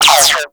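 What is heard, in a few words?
Gatling Gun Projectile By; Warframe; Tau Gatling Gun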